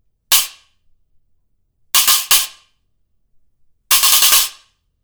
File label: Stacker Pair, cymbal